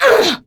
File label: Pain, Female; Warframe; Grineer Female; Battle Barks